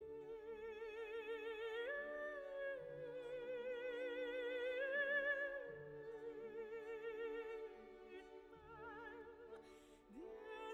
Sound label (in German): Wiegenlied; Strauss